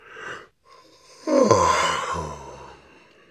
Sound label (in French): baillement